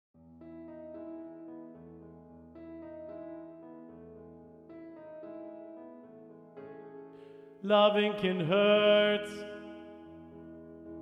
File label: Tenor; Practice Room